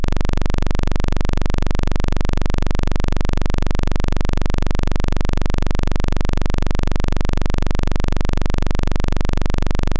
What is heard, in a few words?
tone